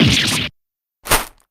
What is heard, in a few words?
damage; Ellen